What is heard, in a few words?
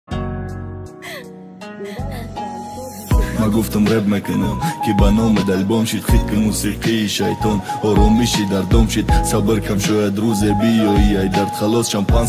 new rap